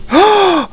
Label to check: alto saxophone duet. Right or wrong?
Wrong